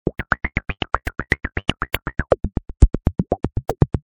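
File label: envelopes; huellkurven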